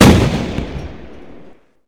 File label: spawn; slender; zerosiki; lethalcompany; thumper